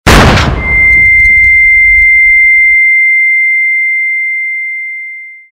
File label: concussion explode; basebuilder